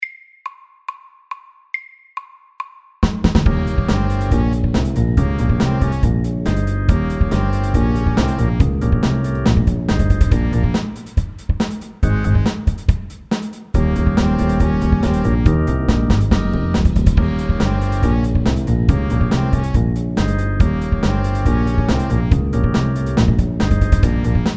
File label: 8 bar blues FAST 140